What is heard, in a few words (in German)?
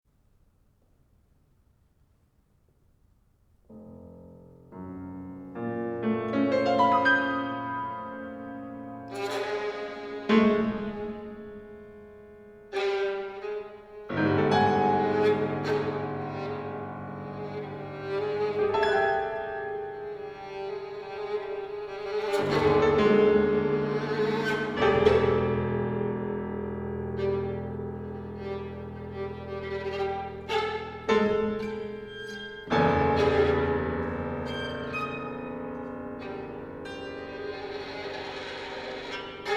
Violine und Klavier; Espaces